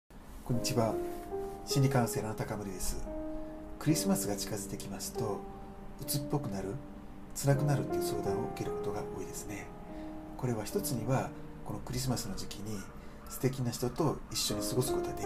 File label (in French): cri